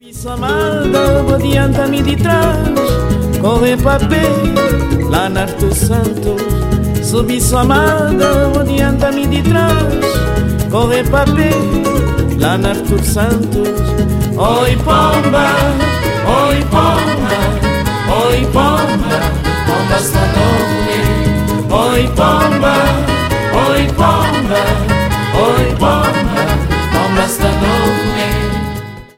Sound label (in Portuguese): voz